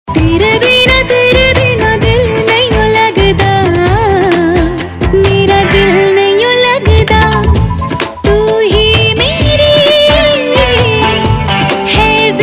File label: Female Version; Bollywood; Tezz